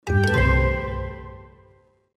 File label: Small Victory; luigi's mansion 3